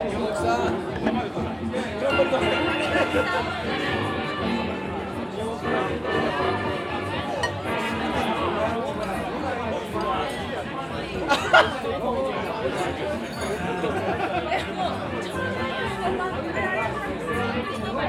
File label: superdelux ambiance; FUKUSHIMA; cal lyall; superdeluxe Tetragrammaton